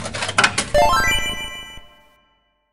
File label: Retry Jingle; Friday Night Funkin' Vs. Kapi Arcade Showdown